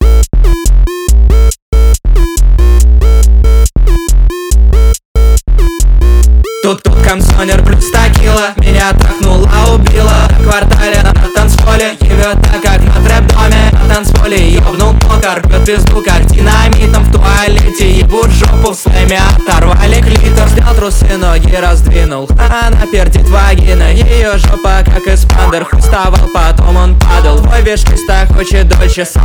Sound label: bassboosted